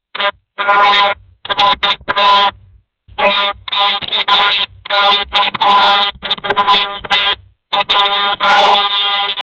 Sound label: robot malfunctioning and shutting down, glitch